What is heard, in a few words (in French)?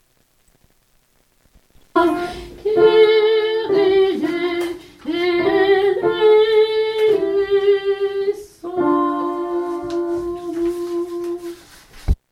Kyrie Soprano